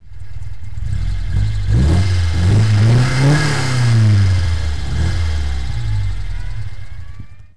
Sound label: Giulietta SS, classic car